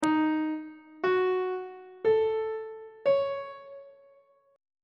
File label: e flat half diminished 7th chord intervals; on treble clef